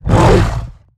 Exertion Light; Evolve; Behemoth

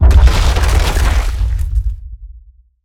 Rock Prison Formation; Evolve; Behemoth

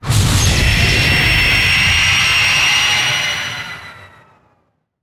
Banshee Missile Homing Start; Evolve; Elder Kraken

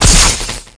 elec idle pop

